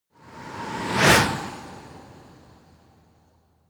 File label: whoosh bicycle hard